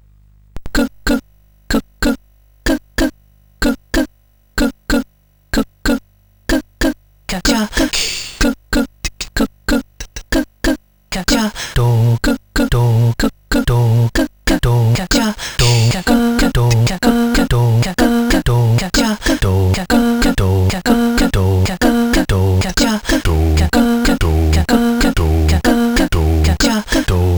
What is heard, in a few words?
Caves, CSG8580R5